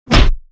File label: Robotic Footstep; SoundsCrate